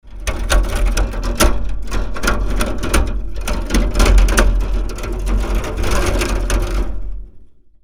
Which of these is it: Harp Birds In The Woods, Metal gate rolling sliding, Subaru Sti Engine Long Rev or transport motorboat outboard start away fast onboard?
Metal gate rolling sliding